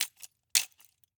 Roller skates, old fashioned, all metal, wooden wheels, clash, strike, clatter, ping, double, catch; Eiravaein Works; Vaeyan IV